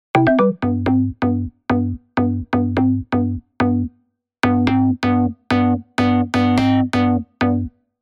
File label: BS, serum 2 bass tech house; revealed serum 2 bass tech house vol 1